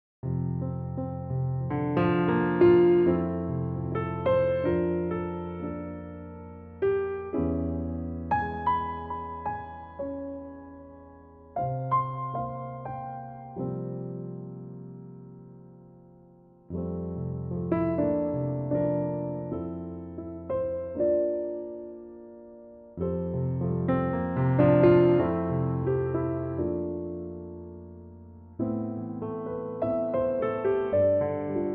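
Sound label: piano track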